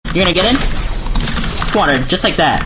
pump

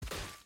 Headshot; Valorant; Gaming

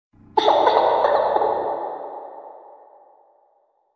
cry; roblox; Meme Sound Effect

